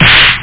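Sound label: House Snare; Drum kit 2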